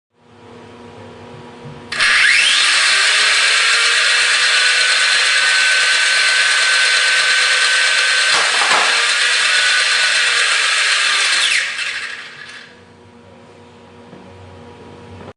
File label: flipper test, robot; omegaforce